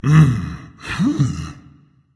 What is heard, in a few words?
agree berserker; cuchulainn